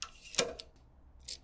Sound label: lock ring lock